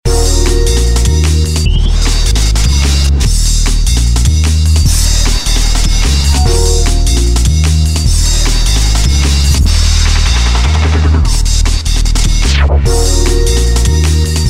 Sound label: DnB Jazzy